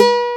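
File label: Spanish B2, guitar; AKAI S-Series CD-ROM Sound Library VOL-8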